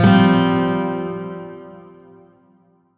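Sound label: Shawzin Pentatonic Minor Chord; Warframe; Lotus Shawzin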